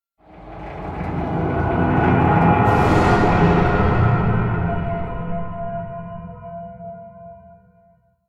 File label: Stinger Swell Long